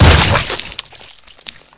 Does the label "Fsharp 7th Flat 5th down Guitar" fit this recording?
No